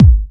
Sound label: Soft Kicks; Vengeance Samples